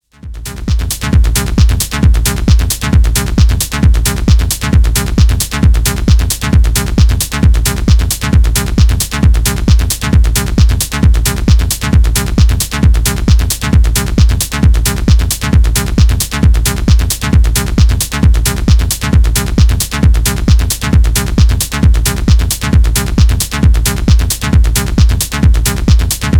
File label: Loop (locked groove)